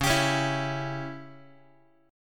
C sharp Diminished C sharp down Guitar 4A 4E 5A 5D 5G sharp 6C sharp; C#dim strummed; JGuitar